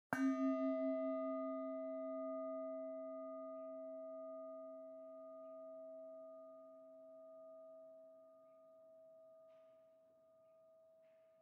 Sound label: Pot Lid Gong